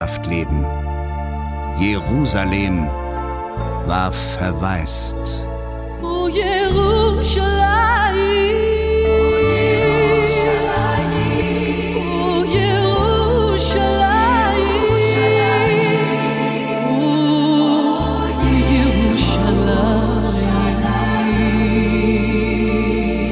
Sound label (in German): gesang der klageweiber